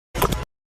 wormhole open; Sci-Fi

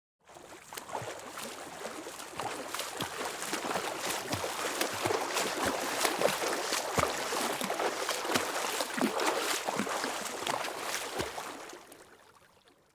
swimming stroke soft; SFX

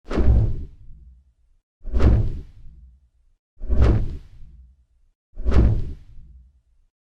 gryphon walking; Foley

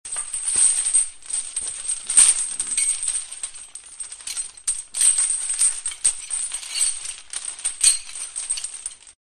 empty theater, Ambient

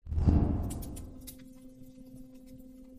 scissors cutting; Foley

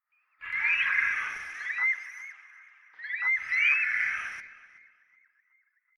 archaeopteryx call; SFX; Archaeopteryx Call 3; DailySounds

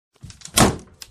crackling ice; Nature

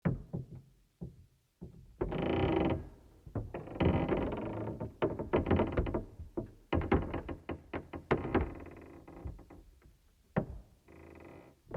ship deck creeking; SFX; DailySounds

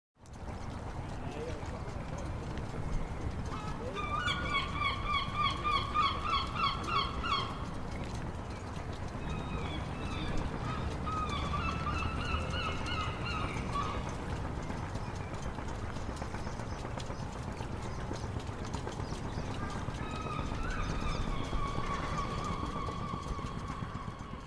harbor ambiance; Ambient; DailySounds

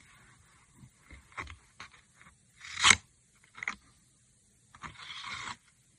footsteps mud; Foley

